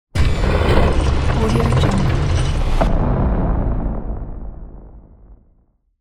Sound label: huge gate